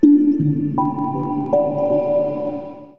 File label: alarm; Rainlendar Lite 2.1